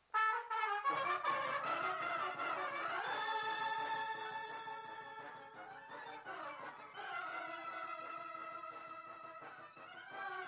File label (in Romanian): Sarba de la Fastaci la fanfara; jocuri si cantece batranesti; Vaslui